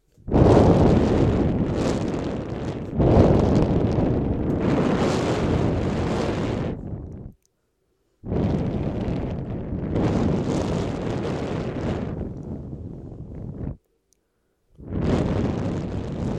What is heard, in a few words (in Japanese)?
左右耳 女性が強く息を吹きかける音ASMR; 快楽音フェチASMR 両耳元で強く息を吹きかける素人女性の音